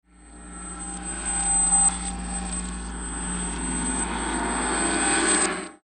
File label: Swell Ring High Pitch Metallic Reverse, Distortion Swell Reverb; Production Element Imaging Element Accent Transition